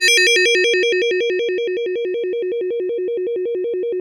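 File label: FM Bell FX DX100 G#1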